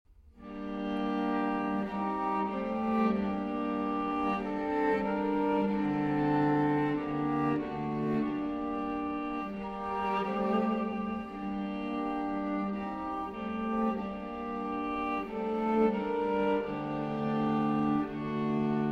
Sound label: String Quartet in D Minor, II. Theme